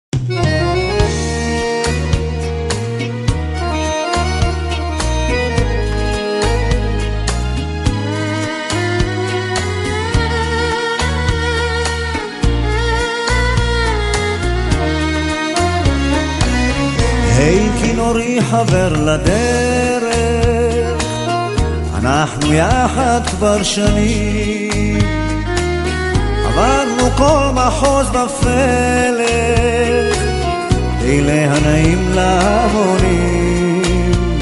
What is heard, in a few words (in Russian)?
plach skripka